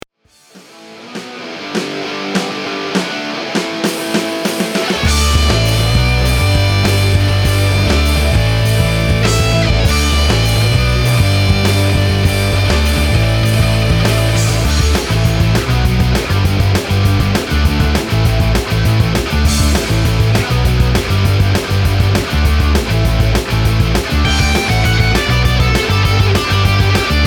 Punkstar 200bpm

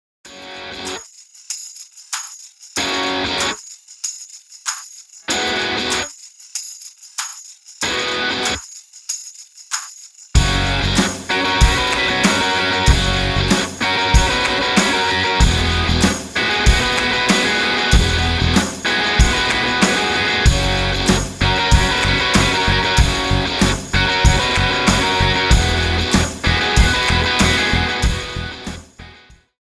Enjoy 95bpm

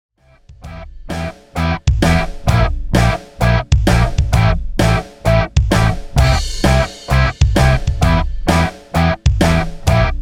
Evil Kasper 130bpm remix

